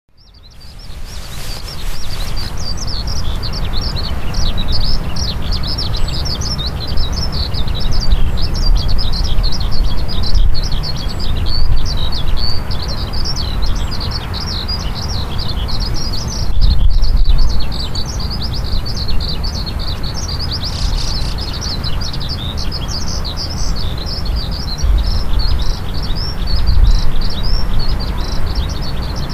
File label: hibari, birds